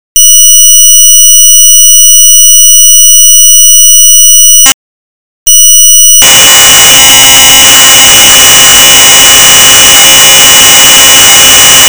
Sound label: 3600 baud